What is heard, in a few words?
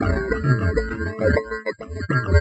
lofi underwater robot world, drum loops and breaks, 100 bpm; 091 to 100 bpm